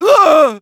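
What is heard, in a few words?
pain severe, German; Medic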